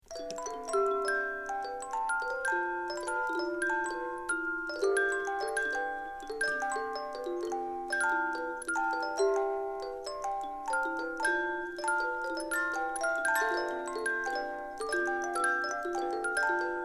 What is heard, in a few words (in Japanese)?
カード式手回しオルゴール; musicbox